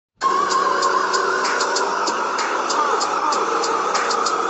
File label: screams; ichigo